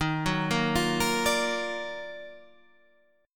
D sharp Minor Major 9th D sharp, Guitar

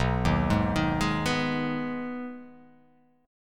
B 6th B down, chord

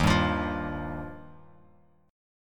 Eb Major Add 9th, Bass Standard; Ebadd9 strummed; 11,10,15,10; JGuitar

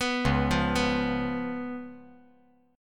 C sharp 7th C sharp

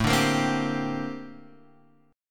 Bb Major 13th A

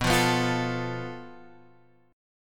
F Major Flat 5th B

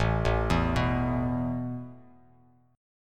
A Suspended 2nd A Bass Standard; Asus2 arpeggiated

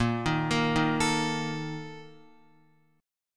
Bb Major 7th Suspended 4th, Guitar; arpeggiated; JGuitar

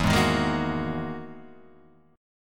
E-Major Sharp 11th E, Guitar Half Step Down

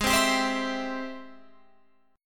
Ab Augmented Major 7th Ab Guitar Open F; strummed; 20,19,19,19,19,19; Ab+M7; JGuitar

